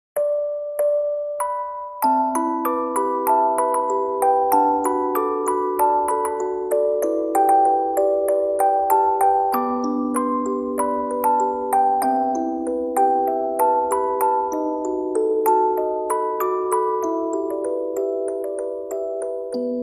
Music Box

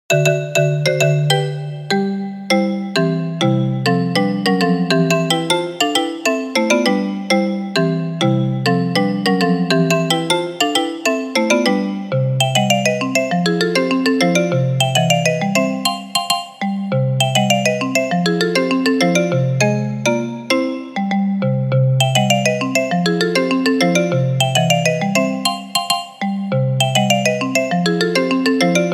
Marimba Remix

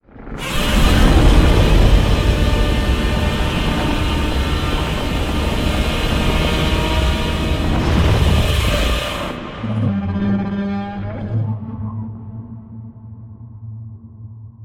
godzilla roar

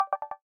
sent mail

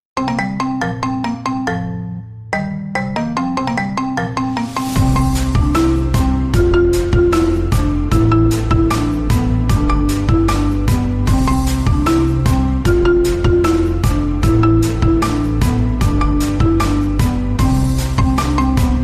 marimba remix

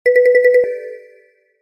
marimba notification

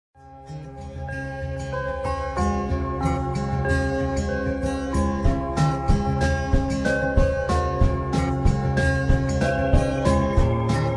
Sony Xperia Alarm

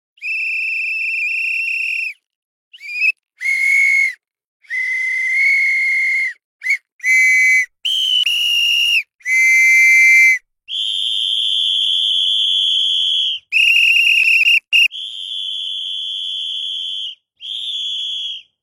Whistle Blow

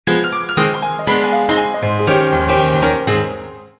funny piano

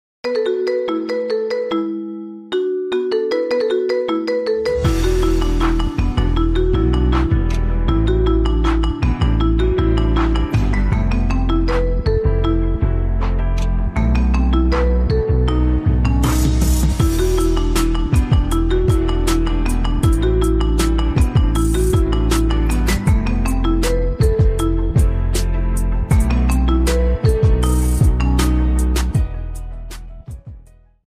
Marimba Remix

